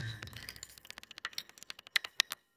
cartoon fail; sound effects

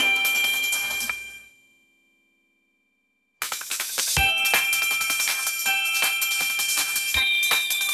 happy jingle

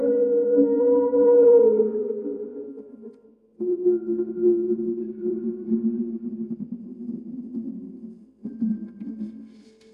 romantic heartbeat; sound effects

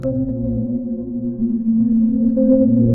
water splash; sound effects

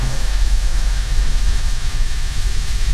hype whoosh; sound effects

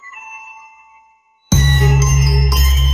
banana slip

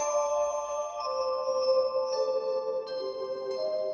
bell sparkle; sound effects

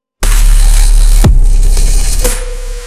bass drop

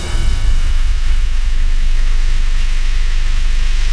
explosion

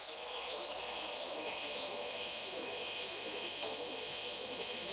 wind whoosh; sound effects

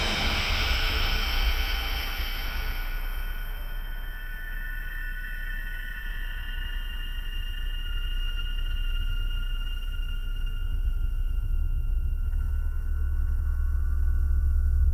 fire crackle

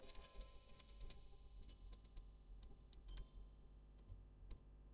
alarm bell; sound effects

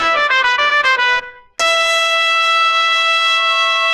tadaa fanfare; sound effects

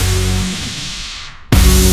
pop sound; sound effects

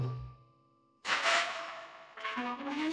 fart sound

